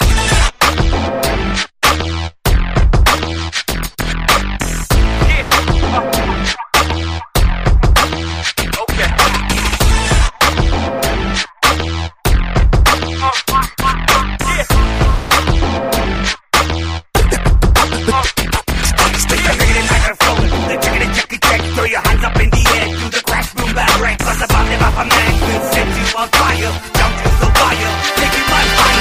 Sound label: robot beat mix ringtone